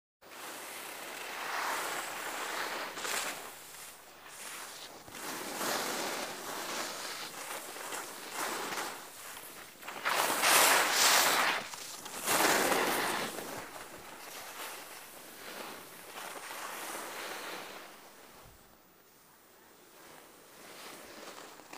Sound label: Skiing in the snow